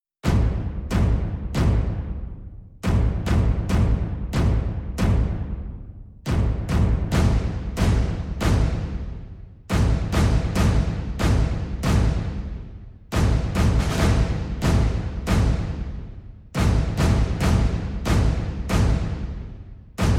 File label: perc only